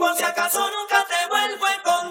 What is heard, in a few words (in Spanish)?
COROS; multitracks